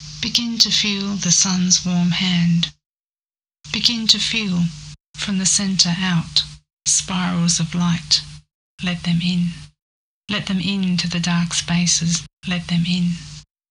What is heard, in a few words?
voice r